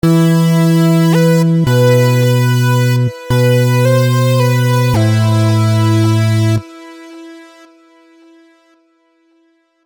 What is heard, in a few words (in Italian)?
basso povero